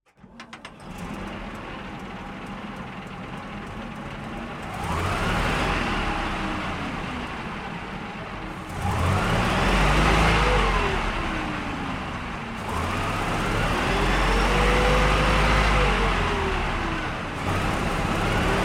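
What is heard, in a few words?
Tiger II on board start drive gearshifts stop off engine; RE20; Pole Position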